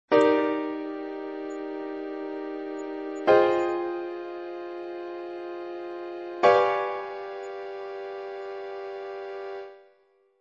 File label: chords